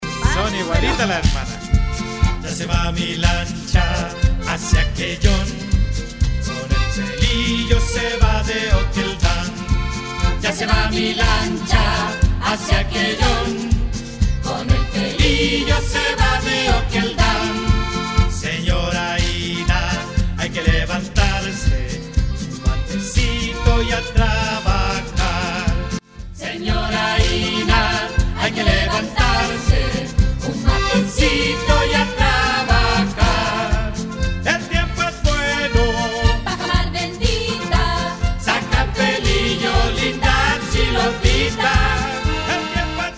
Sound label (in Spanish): Cumbia